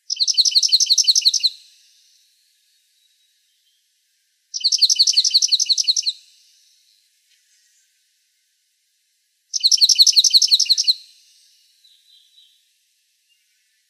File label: DEJU, Emberizids; Coons